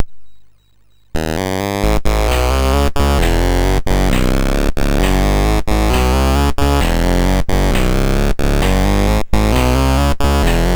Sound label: Electrosound, MOS6581R3; Herman Munster